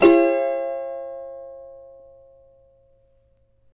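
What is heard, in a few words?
D major, Guitars; Spectrasonics; Hans Zimmer Guitars VOL-2; CAVA CHORDS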